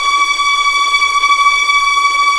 STR TREM, Vlns Tremolo; Roland - String Master Series

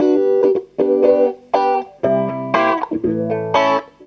CLAV GTR; USB Soundscan vol.32; Roots Disco; AKAI

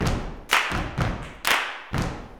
CLAP FEET; Spectrasonics; Vocal Planet; Blues Soul

